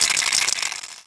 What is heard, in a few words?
spray can shake